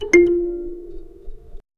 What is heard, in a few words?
kalimba mbira thumb piano